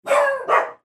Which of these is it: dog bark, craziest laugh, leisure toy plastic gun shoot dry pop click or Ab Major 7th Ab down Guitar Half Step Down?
dog bark